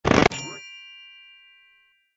heal smooch appear

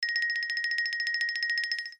downsize miss; toontown-ceiling

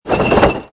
tractor treads

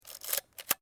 Photo instamatic

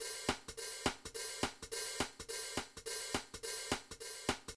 match bg; Poodletooth-iLand; Panda3D

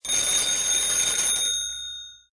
telephone ring; toontown classic

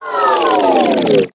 demotion; toontown-classic

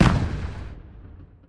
firework distance; Toontown Online

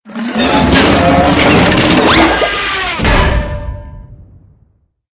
big death; Poodletooth-iLand

